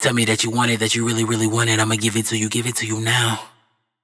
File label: hook Tell Me That You Want It wet, Vocal; Slate Digital Omnivox Vocal Sample Pack